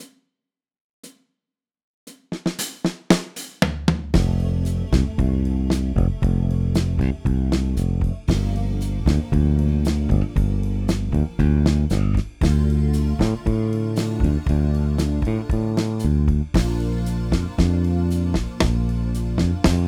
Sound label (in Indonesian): chord drill iringan bossas, bass